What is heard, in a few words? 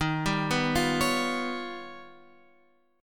D sharp Augmented 9th D sharp, Guitar